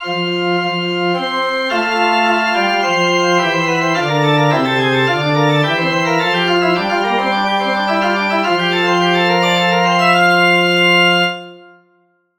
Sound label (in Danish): forspil